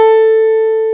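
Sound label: Gibson 335 A4, guitar; AKAI S-Series CD-ROM Sound Library VOL-8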